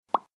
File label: Pop